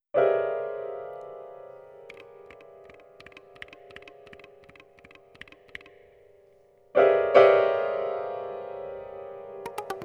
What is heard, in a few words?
Piano Flute